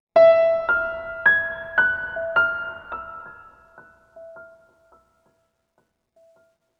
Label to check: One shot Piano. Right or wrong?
Right